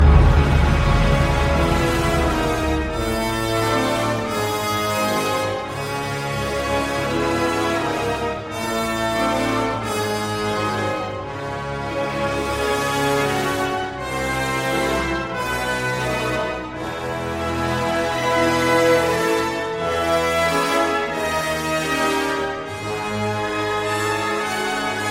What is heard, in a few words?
c sharp min